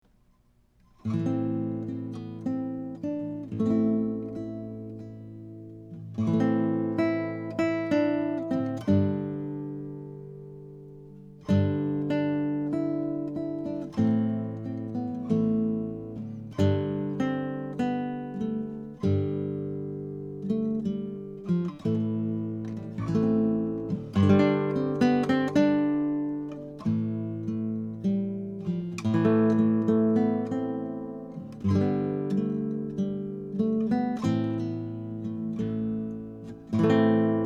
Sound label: Machau; AMI UM25 Proto